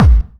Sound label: clear kick drum one shot A key; kick drum samples